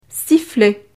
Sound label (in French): sifflet